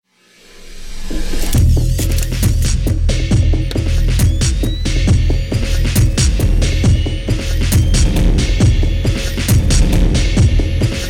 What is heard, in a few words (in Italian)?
tensione